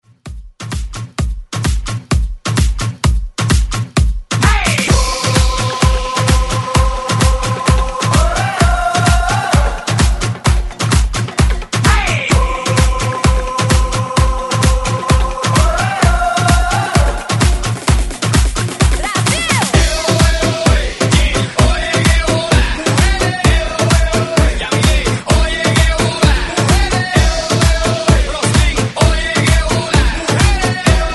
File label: Hype ReDrum 130 Bpm